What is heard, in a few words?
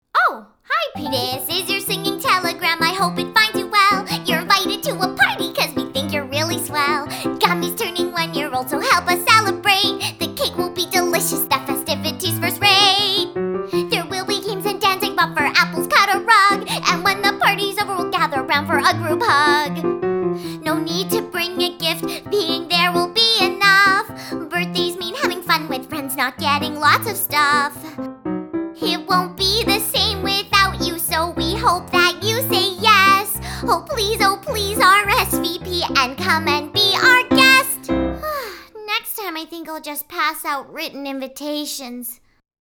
Piano; My Little Pony Friendship is Magic; Season 1